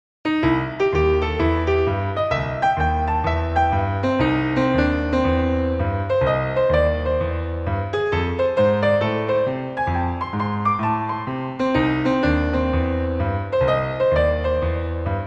Echo Boogie

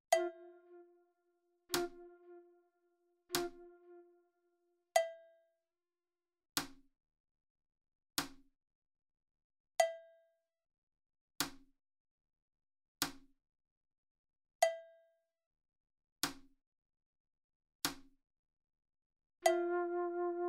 Flute Slow; Uebungs-CDs; Playbacks

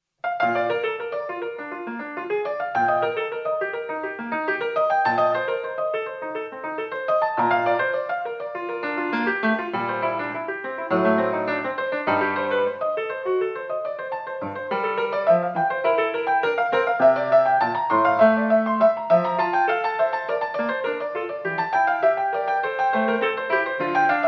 Partita I Allemande mit FX; J.S.Bach; Partita1 Bb

